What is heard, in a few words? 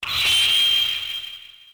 Attack; RedDot